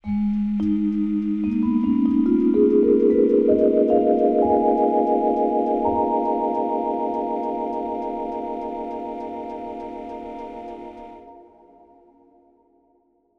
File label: Vibe Intro Idea; Kount's Home Stretch Sample Pack